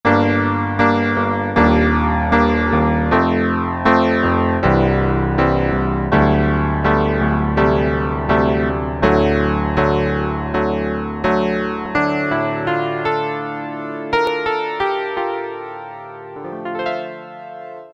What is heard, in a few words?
PIANO, Ensoniq ESQ-1